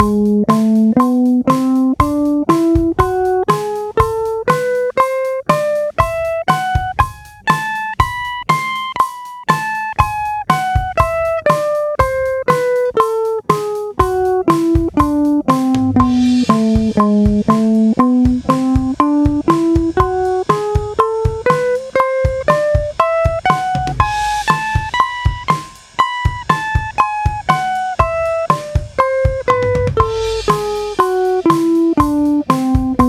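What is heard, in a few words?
A minor Melodic #7 octave 120 quarter, guitar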